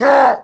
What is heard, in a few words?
Swing; Darknut; Zelda